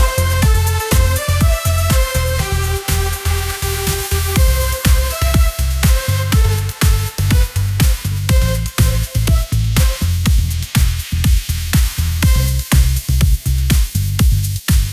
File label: upbeat pop melody, ringtones